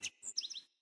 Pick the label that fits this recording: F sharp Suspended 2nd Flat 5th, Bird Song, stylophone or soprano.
Bird Song